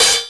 Studio Pedal Hi-Hat Single Hit D Key, pedal hi-hat tuned to the D note; one shots; hat samples